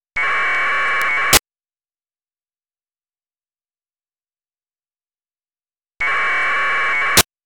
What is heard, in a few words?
AFSK